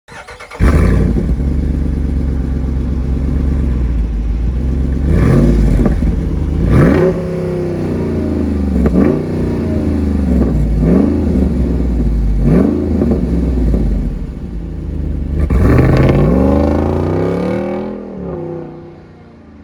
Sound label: jeep wrangler rubicon 392